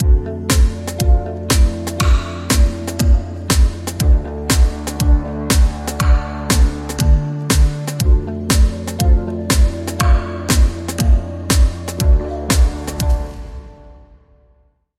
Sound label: progressive vocal tribal house minimal